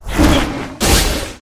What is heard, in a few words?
Tiger